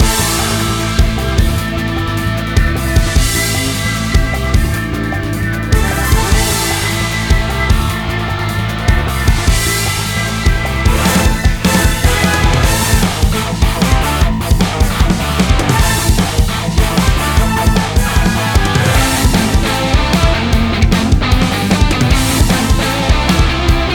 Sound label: Battle Theme; Canto II Battle Theme A2